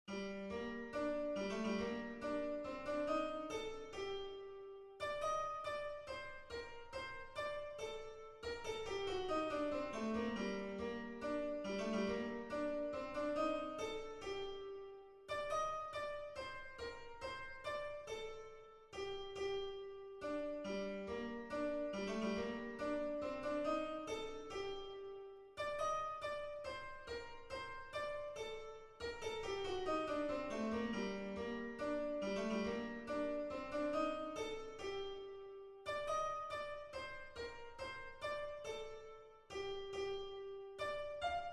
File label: waltz